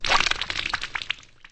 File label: Death